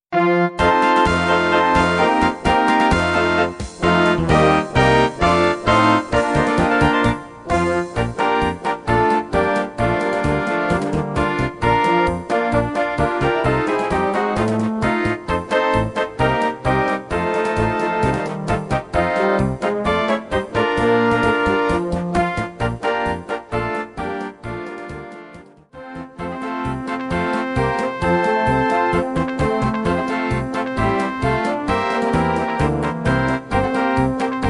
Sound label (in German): Polka